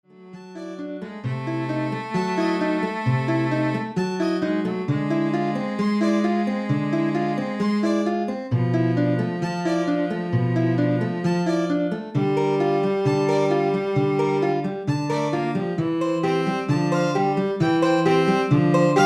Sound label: Trio